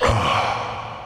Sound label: "uh" orgasm